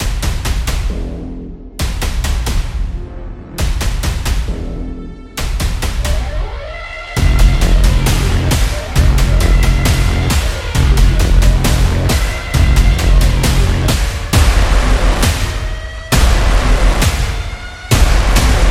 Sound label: Epic Intro